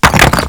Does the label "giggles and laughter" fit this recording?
No